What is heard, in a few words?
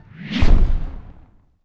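elephant small; Battle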